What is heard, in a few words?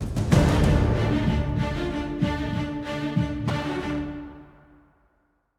190bpm; Legends of Pegasus; windows gamerip 2012